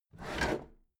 packed drawer smooth clatter open heavy halfway

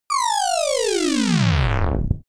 robot turn off turn on

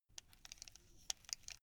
buckle rattle short slow

